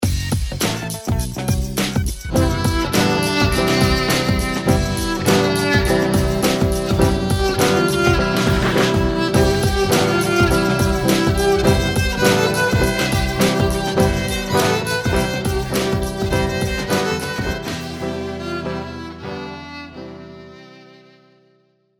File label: 3 Blind Mice, violin